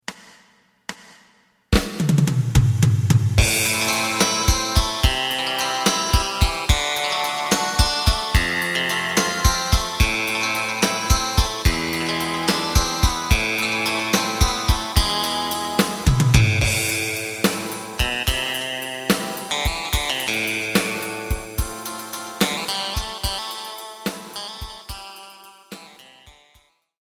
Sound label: bass; エレキバンド用カラオケCD